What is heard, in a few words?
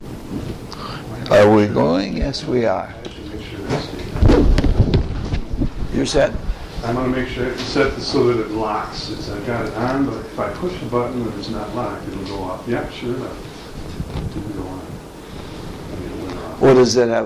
Octaves